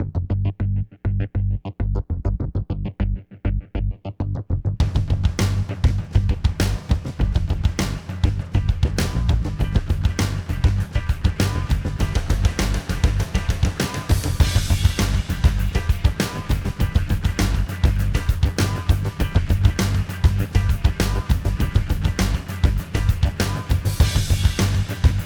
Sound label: industrial rock; Jamie Coleman, Film Composer